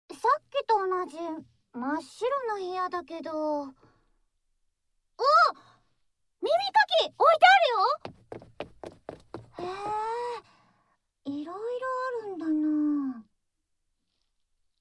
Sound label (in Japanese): 耳かきASMR; 耳かきASMRしないと出られない部屋